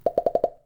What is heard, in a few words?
Bubbles; Princess & Conquest v0.20.06